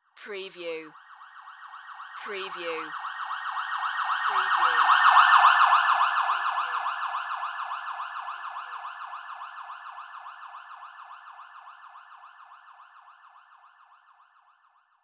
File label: Fire Truck Siren Pass; Vehicle / Transport Sounds / Emergency Vehicles